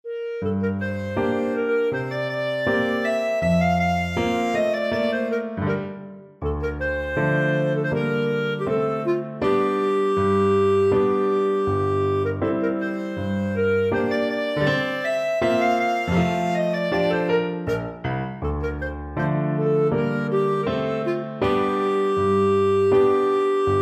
bartok romanian folkdance clarinet

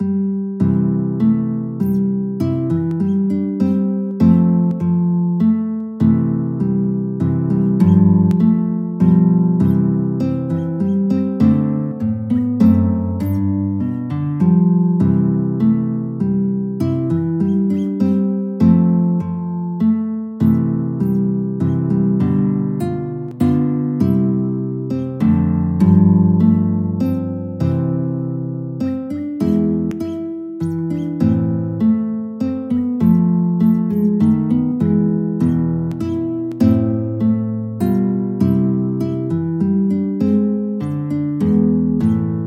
guitar

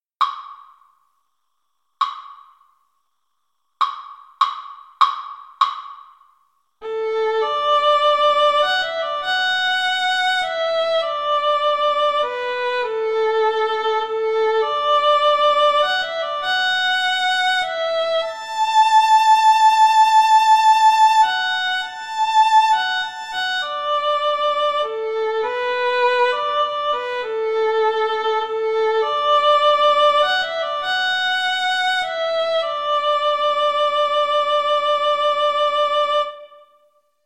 amazing grace, Violin Cello Duet

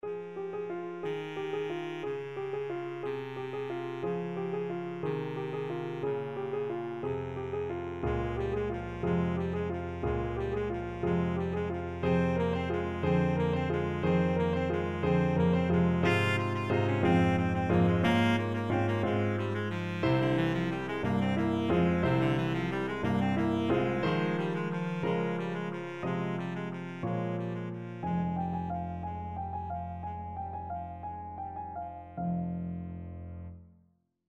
baritone saxophone

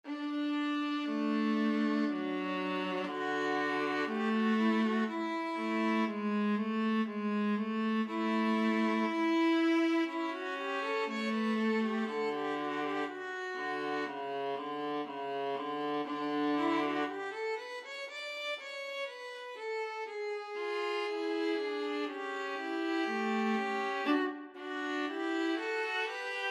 violin viola duet

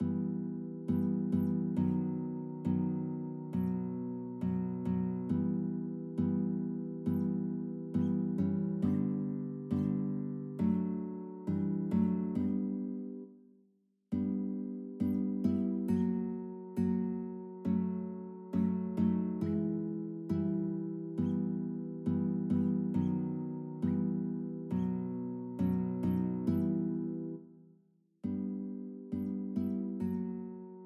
allegretto beet, guitar

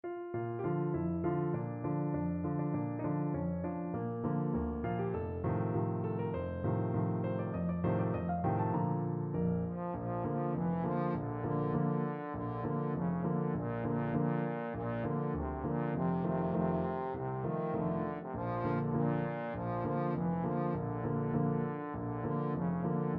ta ra ra, trombone

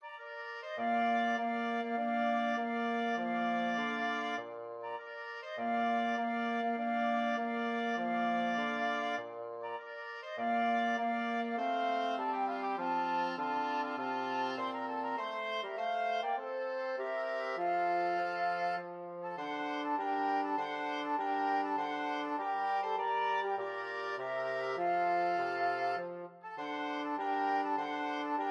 flexible mixed ensemble, 4 players

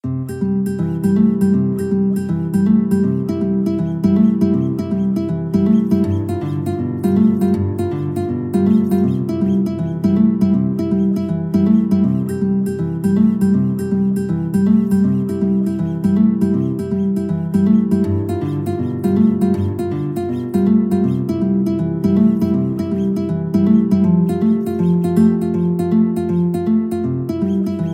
old country blues, guitar

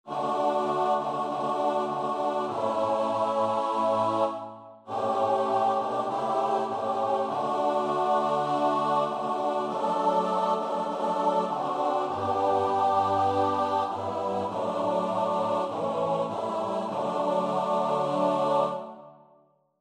choir

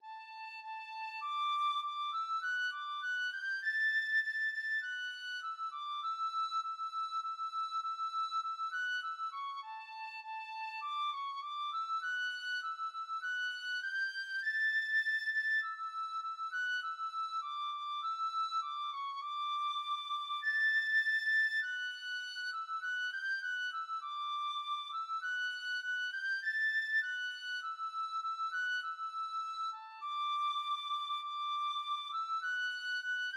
simple gifts, tin whistle

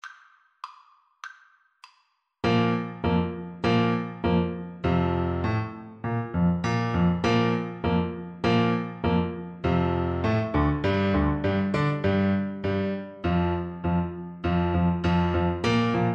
Clarinet

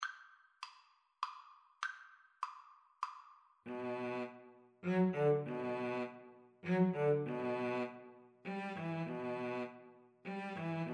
Cello Duet

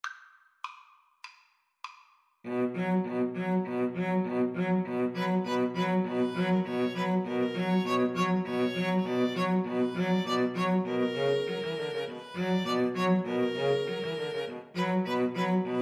string trio

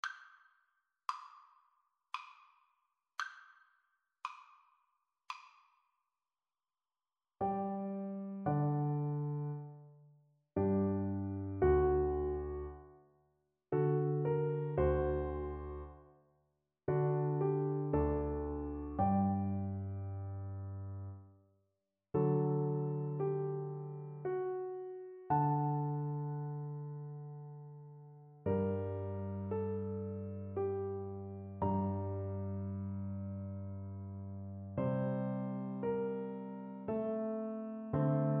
trombone

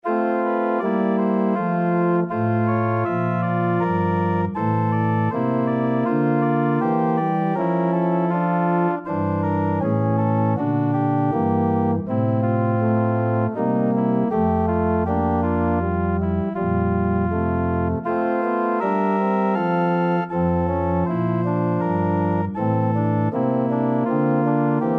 es ist ein ros, organ

